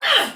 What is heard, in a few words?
Pain, Soldier Female, Battle; Dialog; Barks; Sinecure